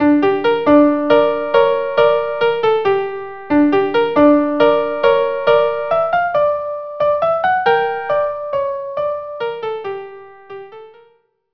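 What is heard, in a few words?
Khosn Kale violin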